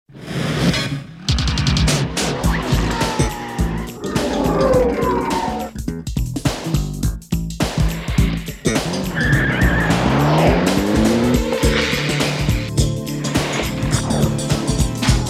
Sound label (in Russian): instrumental